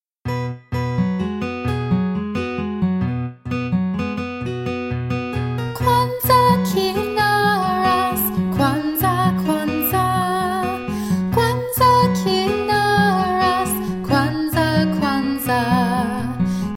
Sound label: kwanzaa song vocal, Children's Music